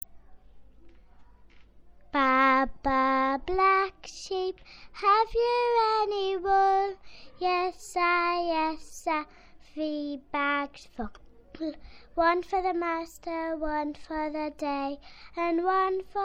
Baa Baa Black Sheep

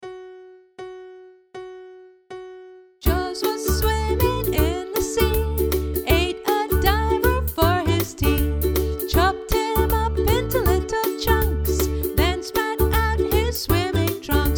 vocal; youth music initiative

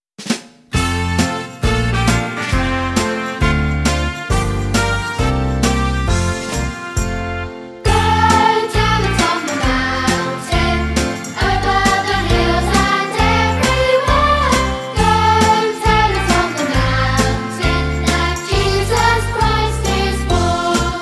Go Tell It On The Mountain Vocal

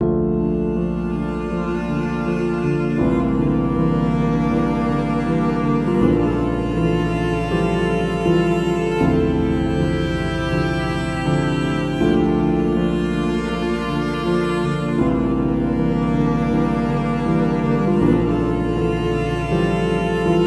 Piano Suite

